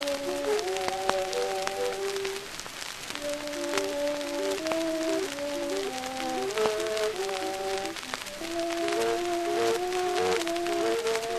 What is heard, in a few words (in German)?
5 inch Berliner Piston und Clarinette; Ach wie ists moeglich